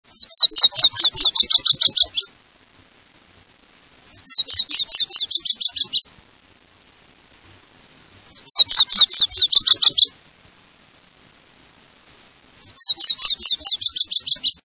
Virginias Warbler

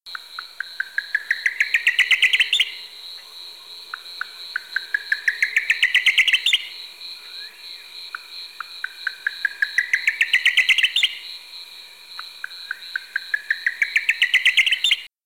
Buff collared Nightjar

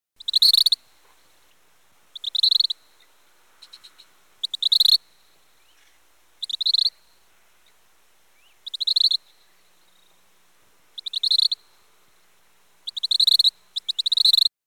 Gray Kingbird, songs

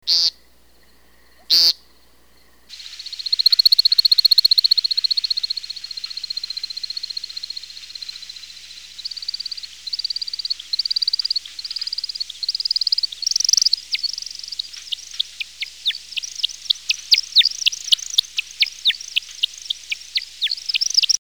American Woodcock

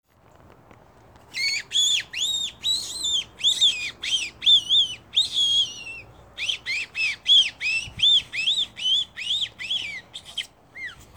Rabbit Distress

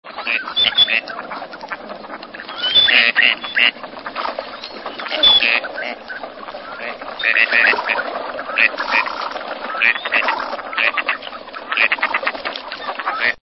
Gadwall, songs

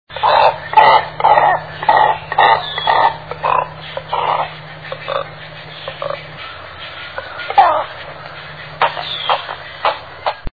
Double crested Cormorant, songs

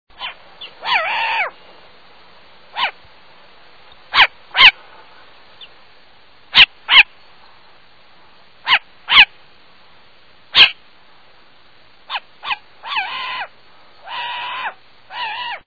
Short eared Owl

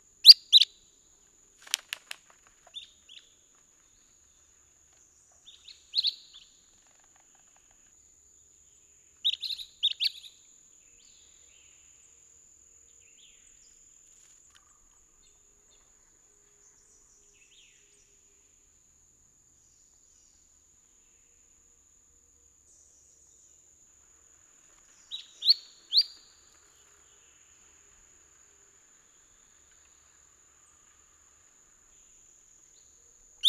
La Sagras Flycatcher

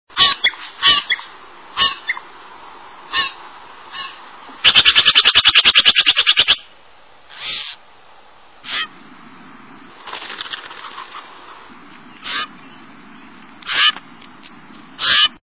Stellers Jay

